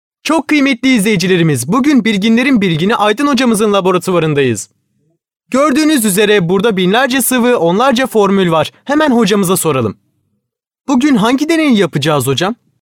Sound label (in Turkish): COCUK SESI